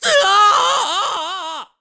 High Fall; screaming while falling; Link; Skyward Sword

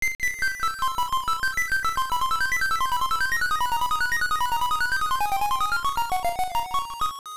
Instrument Harp; The Surf Harp; Sirens Instrument

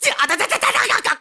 Hurt Magma; hurt by magma; Link; Skyward Sword; zelda

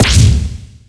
Smash Up Noise; Sheik's upward smash attack noise; Zelda

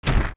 Pat; Darunia; zelda; OOT

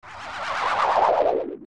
Poe Vanish; zelda; OOT

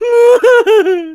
Laugh; Yuga laughing; Zelda

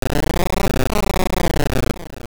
Boss Die; A boss killed (also a Wallmaster grabbing Link, Ganon's roar, and more); Zelda Oracle

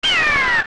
Guay Die

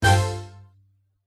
Battle Strike; Orca; Zelda

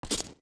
steps stone jump; Jumping and landing on Stone (young); zelda; OOT

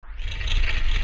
Fall Start; Ganons Tower; Zelda; OOT

